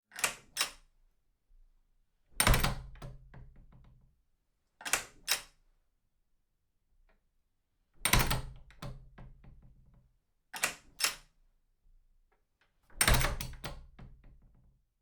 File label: farm door latch